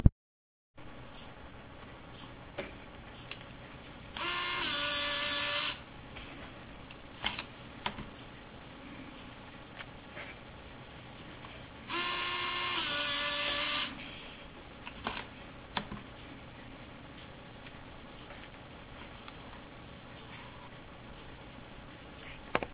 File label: ti-59 cardreader